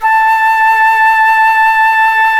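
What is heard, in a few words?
flute vibrato, wind sect; Roland L-CDX-03 Disk 1; Wind Sects 1; Wind Sect 4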